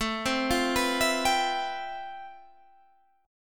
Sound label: F Major Sharp 11th A